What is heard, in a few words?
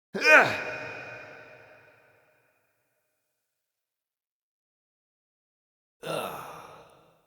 Spell Vocalizations Male; Envoy; Cinematics; Bromius; Bromius Quest Verminia Spell